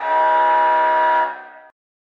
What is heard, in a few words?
derelict alarm loop; Barotraumatic; Carrier Leviathan